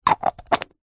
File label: leisure toy plastic bubble two halves close click together